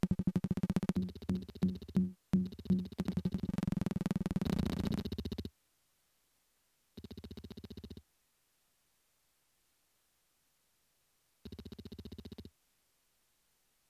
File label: 030bpm; elektron machinedrum